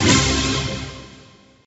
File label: hit, pinball; Excalibur